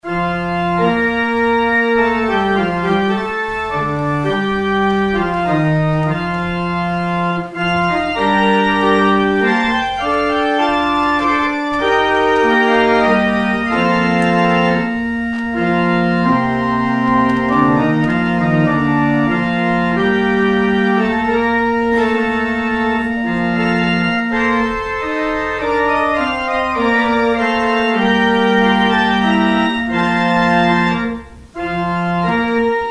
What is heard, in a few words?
organ outro